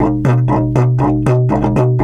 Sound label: 120, Didgeridoo